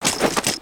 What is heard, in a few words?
Dance; Skullken; creatures organic